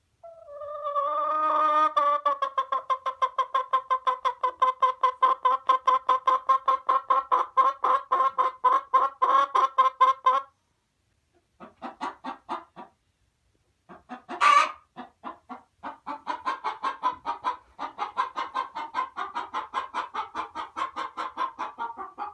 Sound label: chicken sound; صدای مرغ